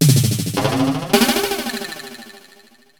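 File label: percussion, ambient, 160; eJay; Drum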